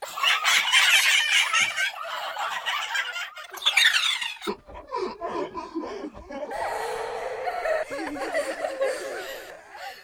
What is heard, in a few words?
teenager laughing